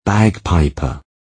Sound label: bagpiper